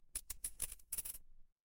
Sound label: retro game coin